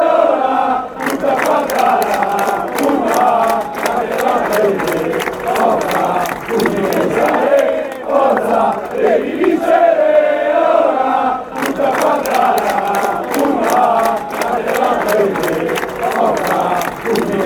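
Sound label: you have to win, football chant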